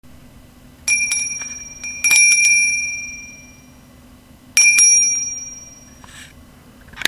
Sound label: angel bell